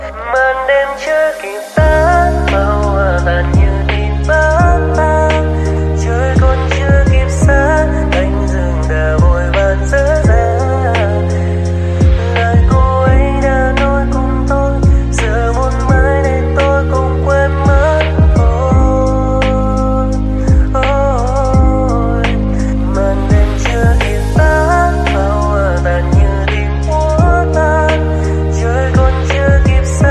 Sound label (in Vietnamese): Nhac Chuong Lofi